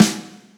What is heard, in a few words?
snare